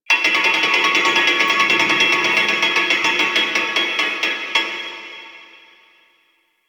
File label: Grid Loop; Warframe; Entrati; Vaults